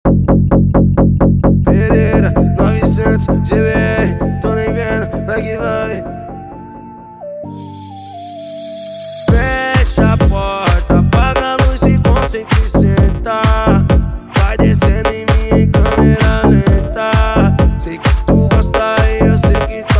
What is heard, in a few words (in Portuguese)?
mega funk